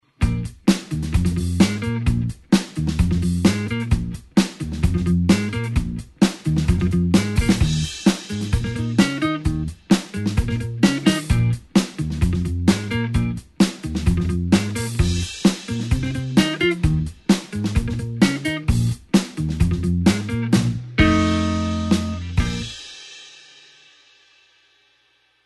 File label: blues 8beat backing note unison